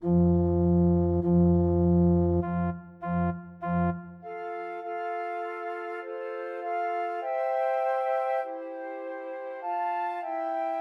Trios for Three Flutes; O Come All Ye Faithful; Christmas

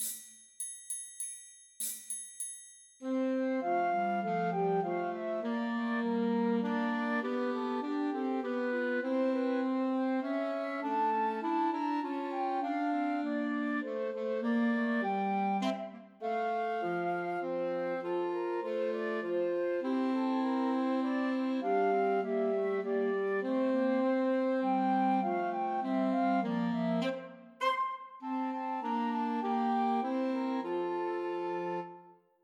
Flute Clarinet and Alto Saxophone; We Wish You A Merry Christmas

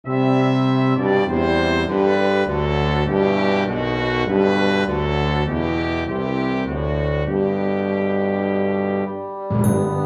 Mini Bassoon Track with Melody; While Shepherds Watched

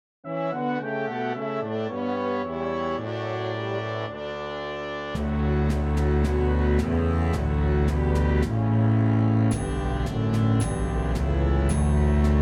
with Melody; Good King Wenceslas

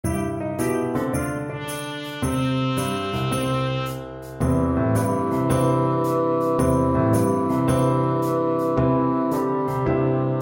Tenoroon Track with Melody; Jingle Bells

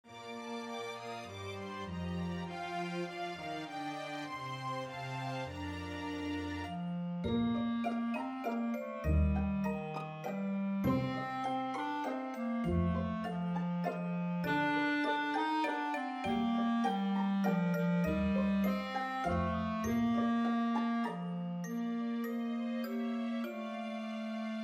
Clarinet Track with Melody; We Wish You A Merry Christmas

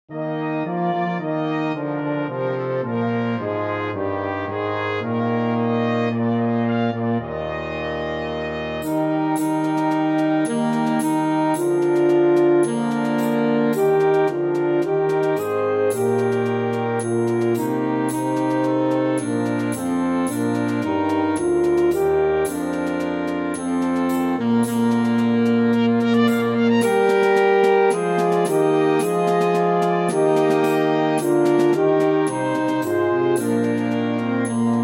Saxophone track with Melody; O Come All Ye Faithful

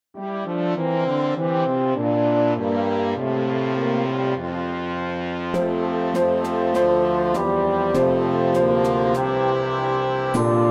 Tenoroon Track with Melody; Good King Wenceslas

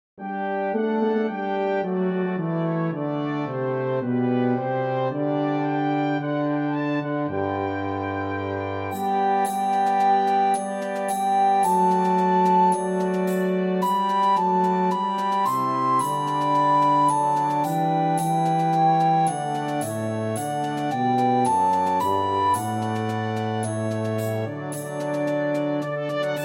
Recorder track with Melody; O Come All Ye Faithful

